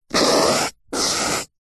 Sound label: foley foam mark score or draw on